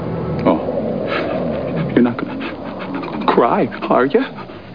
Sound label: cry